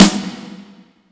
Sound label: Open Rim Shot, Yamaha PSR E305